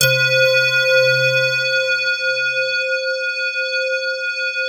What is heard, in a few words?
PAD, ATMO PADS; Best Service; XX Large